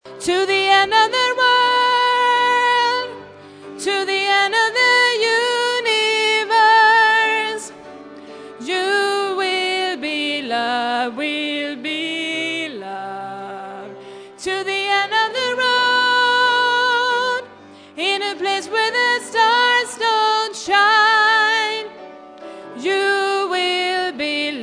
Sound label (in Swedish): sopran